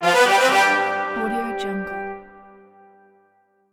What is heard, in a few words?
royal announcement sound